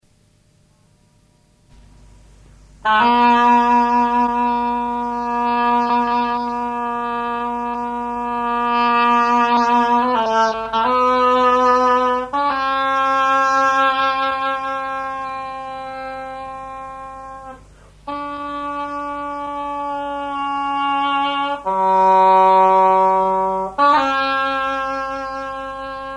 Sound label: 5 likes for oboe